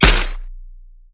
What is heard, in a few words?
Futuristic Assault Rifle Single Shot; ML-Agents; FPS Game